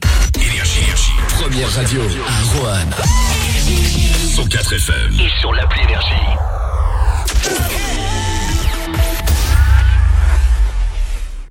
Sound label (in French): JINGLE, Première Radio; ROANNE, 104 FM; NRJ